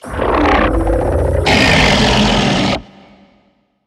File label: beast vocal ghost attack roar